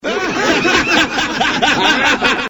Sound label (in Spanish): risa; niko